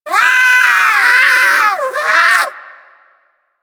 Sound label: Sea Bird Player Lure Call; PoE; Animal Lure; Ostron